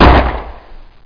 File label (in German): GEWEHR; Kunsthaus Tacheles